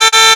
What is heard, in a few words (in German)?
hupe; Mario Kart 8; gamesoundopedia